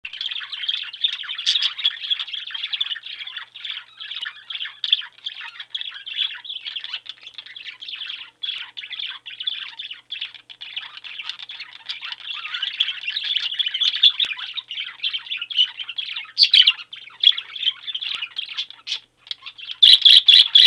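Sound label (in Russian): голоса попугаев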